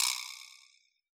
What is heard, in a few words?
vibraslap, Yamaha DJX Rock Kit; 144 Rock Kit